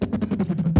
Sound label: HUMAN FILL, DRUM FILL; Crypton - DrumBase5000